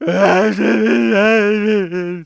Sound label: Cry; Picnicboat